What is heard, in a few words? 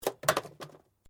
Metal Light Movement Minor; Sound Effects:Materials